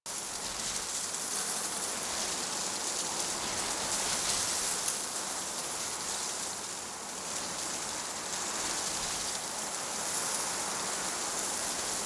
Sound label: Tree Wind Loop, Ambience; Props; Warframe; Duviri